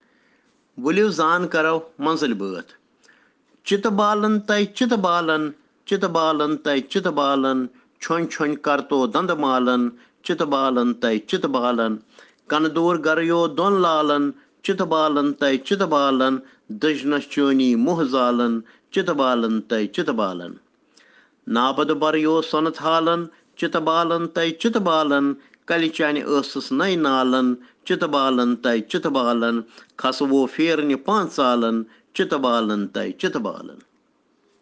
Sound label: Children Rhyms in Kashmiri